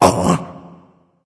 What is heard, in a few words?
surprised; smite; ahpuch